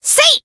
Voice attack

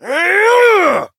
Voice attack

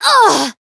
damaged; tsukiha

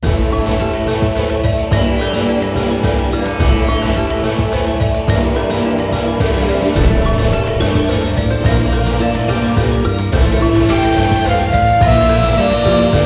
bgm dungeon funeral cavern

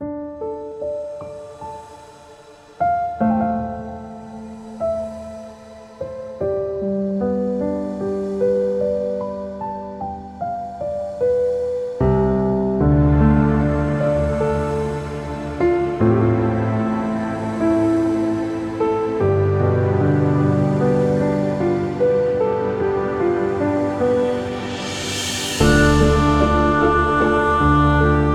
Bgm dungeon

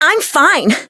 damaged; sherinu

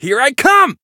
Voice attack; mighty

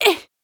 Voice damaged; felmina

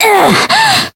Voice damaged

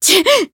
Voice damaged

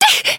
damaged

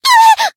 Voice damaged

